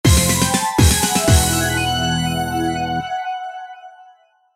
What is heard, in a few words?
Jingle Mission End; Gun Gun Pixies